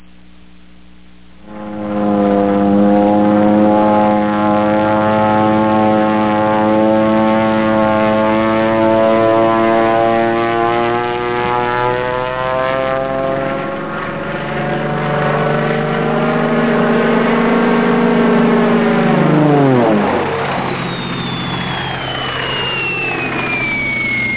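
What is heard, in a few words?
bomber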